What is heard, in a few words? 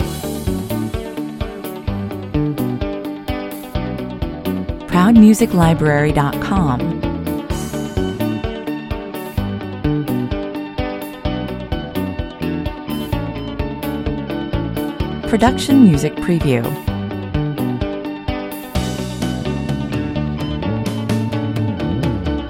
corporate loop